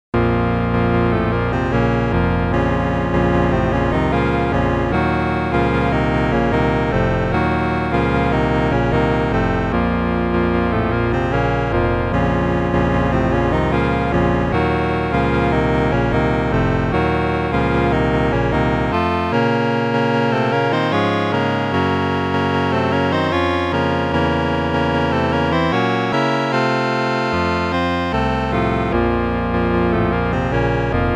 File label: Combat; u3-amiga